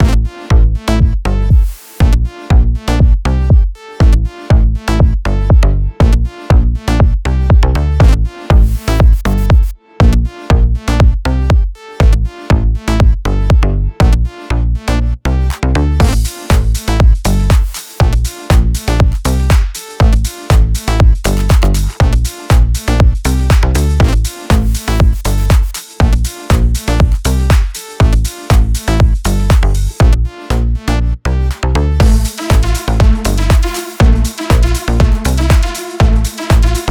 deep house; by burak sound bank; deep house vol 9

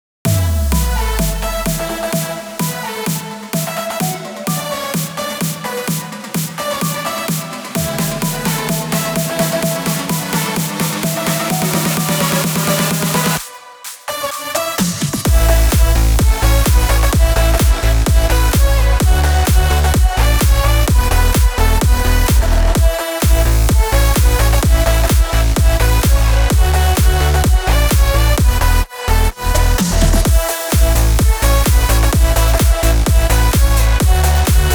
house electronic techno; By Burak Sound Bank; house electronic techno vol 6

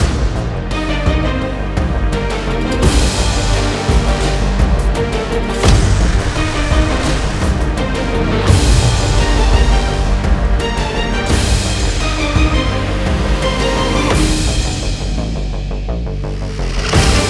cinematic; by burak sound bank; tension gerilim vol 51

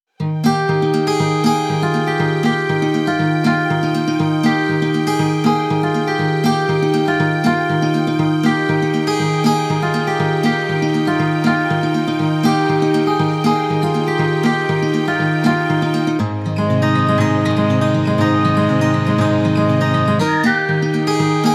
sweetness whistle intro; by burak sound bank; cheerful vol 3

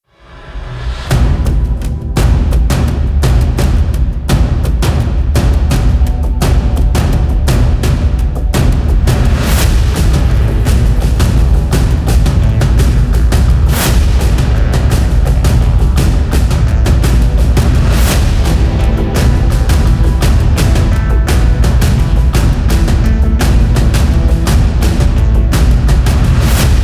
tension violin composition; gerilim; By Burak Sound Bank; tension vol 6

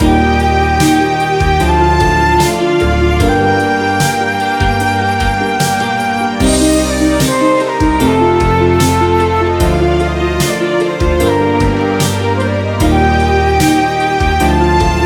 dramatic guitar comp drum; By Burak Sound Bank; dramatic dram vol 15

